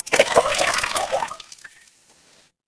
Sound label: water splash